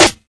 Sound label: juicy acoustic snare sound a key, Royality free snare one shot tuned to the A note; snare-drum-samples